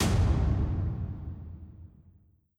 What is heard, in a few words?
Impact Fight